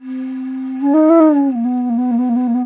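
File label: bansuri, World Winds; E-MU Producer Series Vol. 7 – Old World Instruments; World Combos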